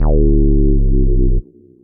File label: Bass, synth bass; Ableton; Factory Packs